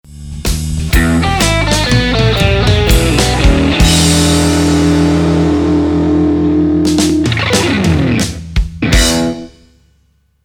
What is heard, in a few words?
lick